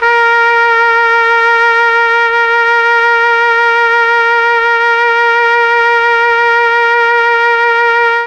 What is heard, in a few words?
A#2 trumpet, Mellotron; CHMB TRUMPET; Mike Pinder - Mellotron Archive CD